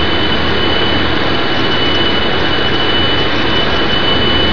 KC-135

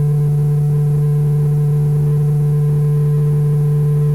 Auxiliary Hydraulic Brake Pump

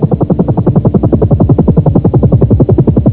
Helicopter

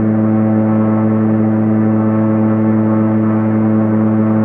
Prop Min Pitch

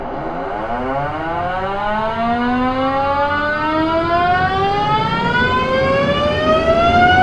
APU Starter Motor

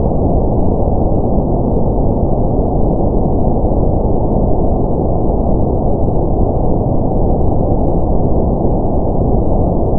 Air Impact Noise

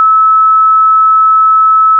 1300Hz Sine Wave

